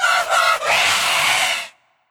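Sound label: Banshee voice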